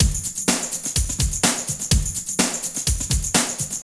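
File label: drum loops, right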